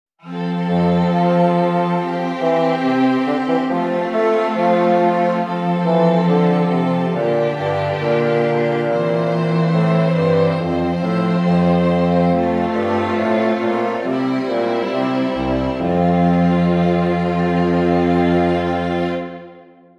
Stimmiger Contrapunkt; 5 Gattung; Ronge

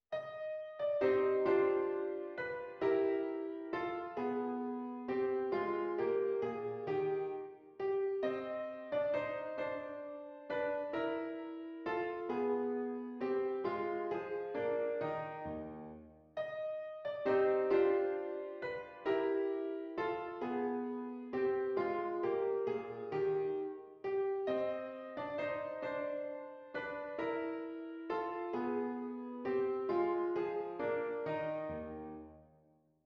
Menuett

